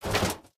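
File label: inventory items weapon big; STALKER; IWP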